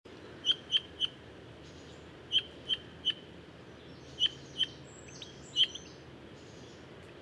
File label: Pyrrhula pyrrhula; SETE